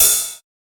crunchy pedal hi hat one shot c sharp key; hat samples

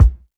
raw kick drum b key

